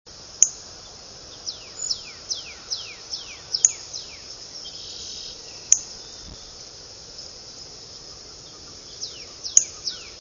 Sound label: cardinal female chit; birdsongs